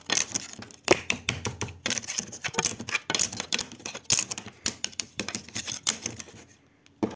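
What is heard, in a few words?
zvuk lineiki, ruler sound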